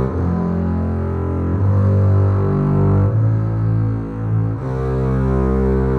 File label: Basser